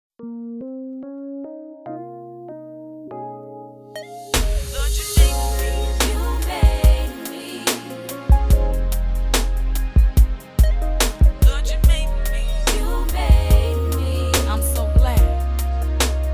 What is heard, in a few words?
lord you made me instrumental